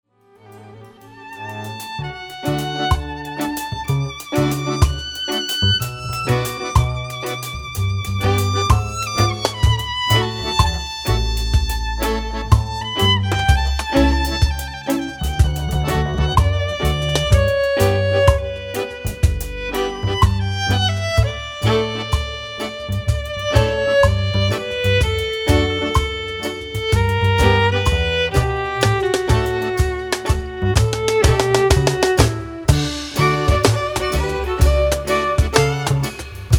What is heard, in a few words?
reggae